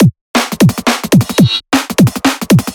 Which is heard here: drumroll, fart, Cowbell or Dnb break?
Dnb break